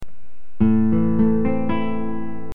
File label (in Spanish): ejercicio acordes, guitarra